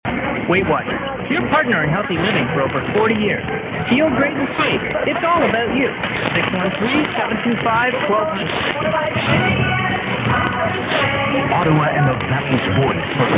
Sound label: 580, mw dxing; cfra